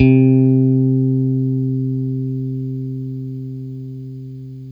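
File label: Stratocaster, Guitar; Quantum Leap - Guitar & Bass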